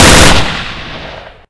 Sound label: m16 burst; firearms